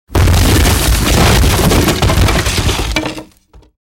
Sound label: impact wood; BRYTLJUD; TRÄ